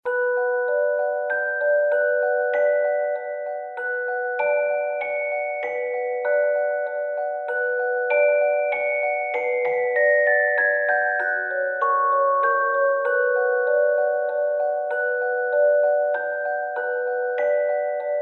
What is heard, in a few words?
Music Box Nostalgia